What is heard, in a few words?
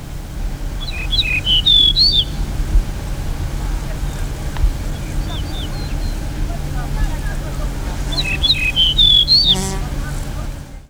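Yellow-breasted Bunting Yakutsk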